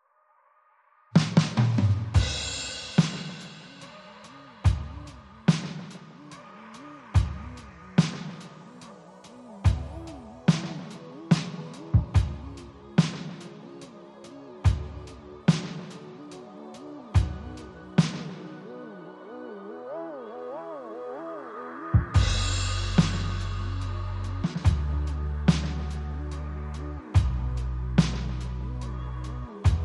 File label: Drums